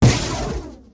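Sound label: XPLOSN, surface explosion; MicromanÃa 35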